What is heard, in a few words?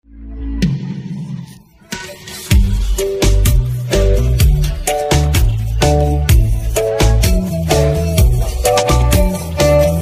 Instrumental